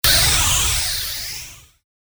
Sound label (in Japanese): ビーム砲 モノラル